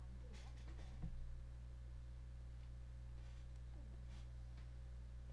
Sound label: duckling